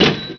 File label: register